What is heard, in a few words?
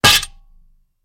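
Sword Clanks Single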